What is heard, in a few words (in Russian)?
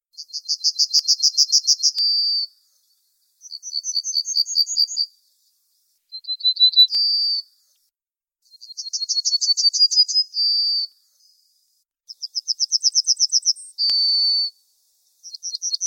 обыкновенная отличия; овсянки обыкновенной; Изучаем песню овсянки обыкновенной